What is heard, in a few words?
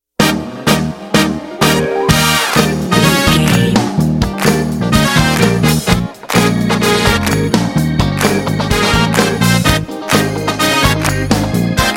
hit me funk